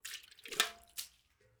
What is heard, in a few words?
waterbucket throw; Rust